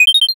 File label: sparkle